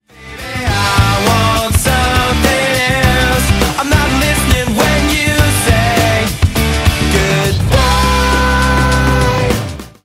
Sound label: cadences